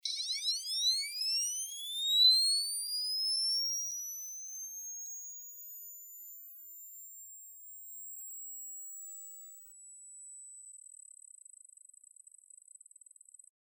electronic flash charging

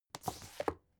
book shelve

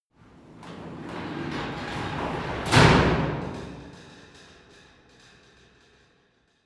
garage door close interior hard

